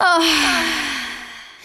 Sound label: female orgasm